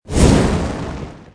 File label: fire impact; merge-dragons-2